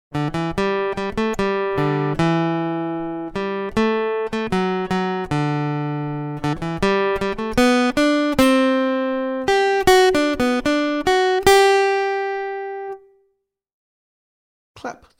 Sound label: Aural Rhythm Test, acoustic guitar; Grade 7